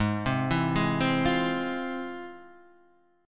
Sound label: F Minor Major 7th, G sharp